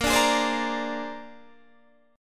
Bb 9th Bb down Guitar DADGAD

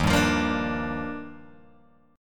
D sharp Major 9th, Guitar Open G; strummed

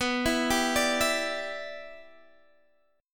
E Minor 7th B

